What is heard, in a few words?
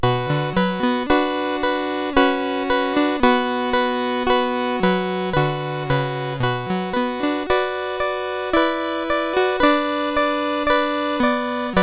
Prelude in B Minor, CSG8580R5; Op28 No6